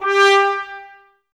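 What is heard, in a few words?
brass trumpets G; Miroslav Vitous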